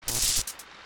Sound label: battery fail